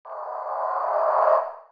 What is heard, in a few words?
Distorted Sweep; Cymatics; Astro FX 3